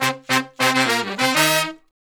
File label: Horn Section; Sonic Foundry (Sony Creative Software); Jazz Solos and Sections